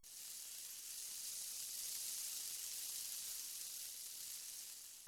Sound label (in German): Schneerieseln